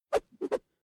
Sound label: Whoosh Swish Sweep Long Bamboo Cane Swipe Squence Varing Pitch; Staff And Rod